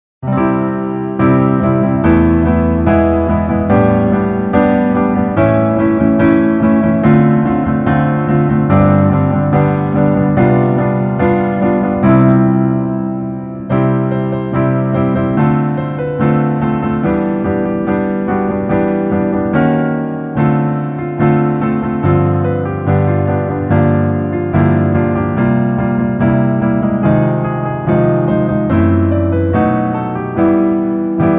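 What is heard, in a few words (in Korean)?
Piano version 멜로디 MR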